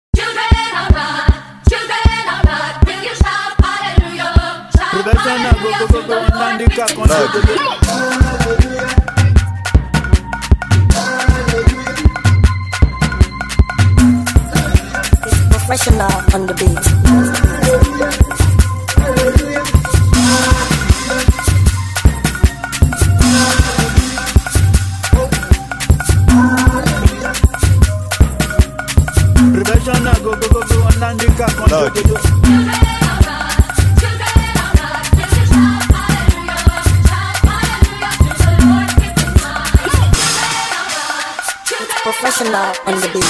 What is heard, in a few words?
Shout Hallelujah Mara Beat; Nigerian talented producer